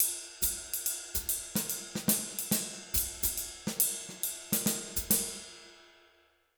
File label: Jazz Latin Drumloops; USB Soundscan vol.08; AKAI